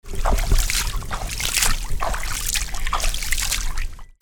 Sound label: WATER